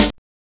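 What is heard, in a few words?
house snare drum; E-Lab; X Static Goldmine 1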